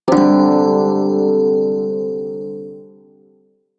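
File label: Prime Shawzin Pentatonic Major Chord D; Music; Warframe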